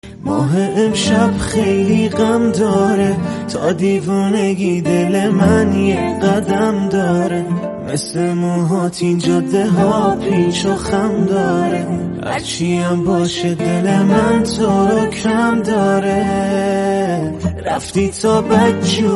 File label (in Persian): صدای دختر